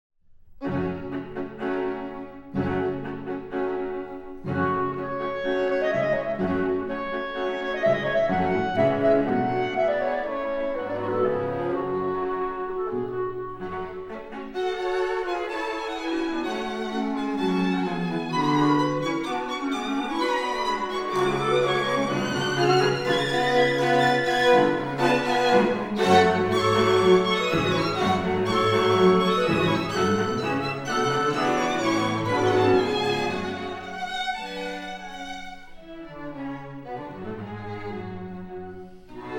quintet; Coleridge-Taylor; 4th Movt

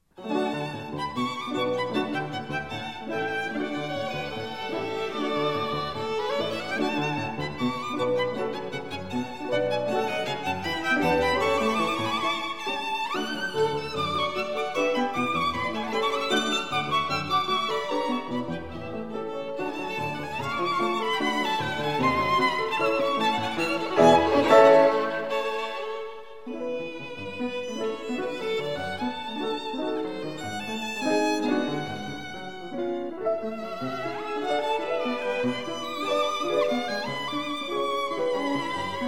serenade; sinding

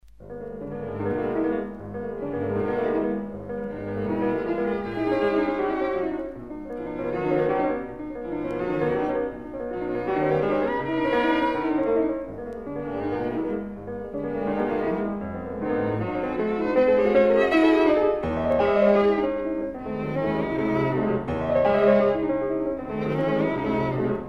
piano trio; godard

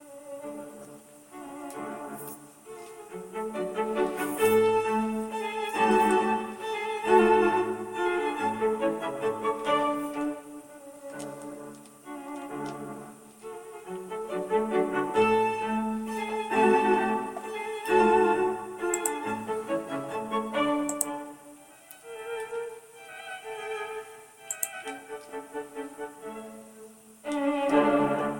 german dance; schubert

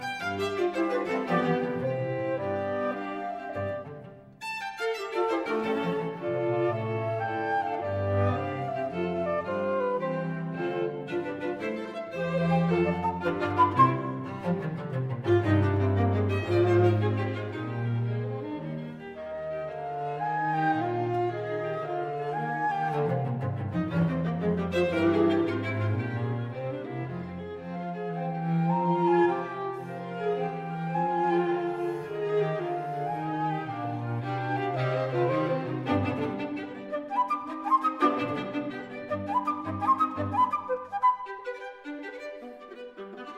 flute qt; ries

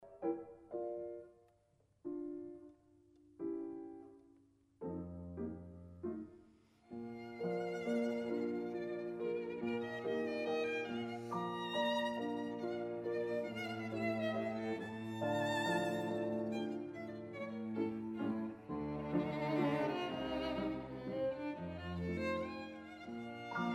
piano trio; marschner

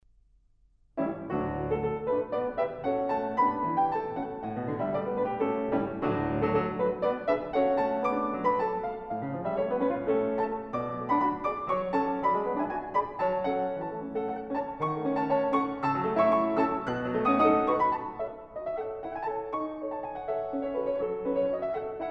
piano trio; jongen

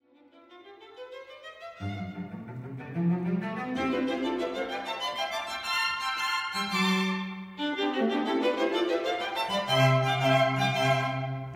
piano sonata; beethoven

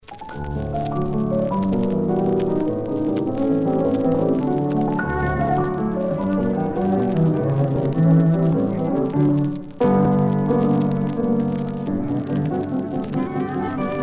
piano trio; marschner

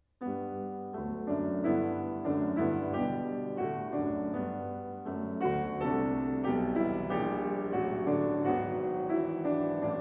piano trio; herzogenberg

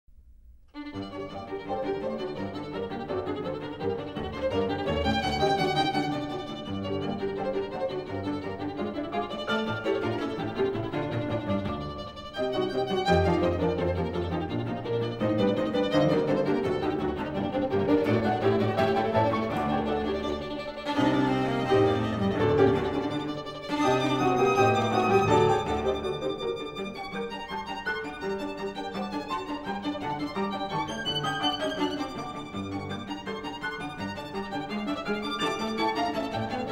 trio; foote

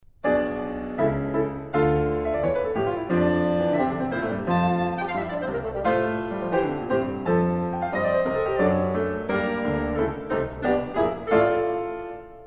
trio; glinka

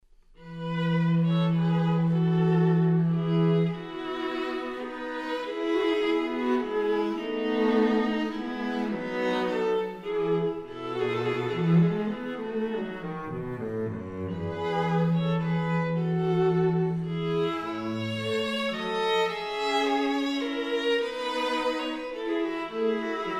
trio

